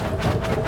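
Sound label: Loop mechanical Train; Puddle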